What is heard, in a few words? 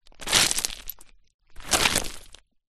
stones small movement with hand; foley